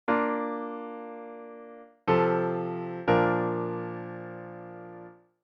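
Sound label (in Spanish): Cadencia Auténtica Imperfecta, Bb; auditivo de cadencias autenticas plagales rotas y semicadencias; Identifica la cadencia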